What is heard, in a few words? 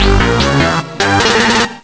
Fish Swallow; The giant fish swallowing something; zelda